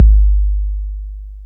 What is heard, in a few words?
TR-808 Kick, Roland TR-808